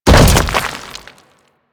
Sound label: Land Dirt, Footsteps; Enemies; Warframe; Grineer